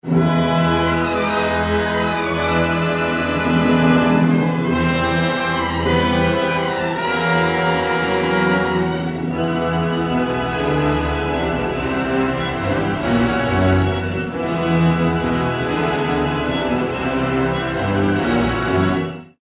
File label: rimsky korsakov scheherazade sea and sinbads ship; Scheherazade: The Sea And Sinbad's Ship; 1888